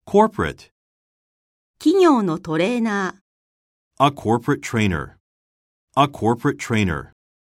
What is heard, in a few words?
corporate